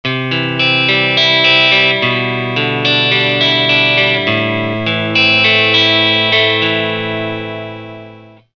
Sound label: Clean Arp Comp, Guitar Effects Pedals; Pro Co